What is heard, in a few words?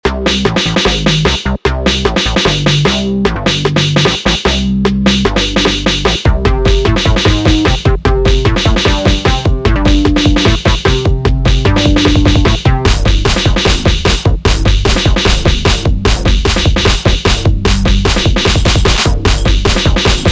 bass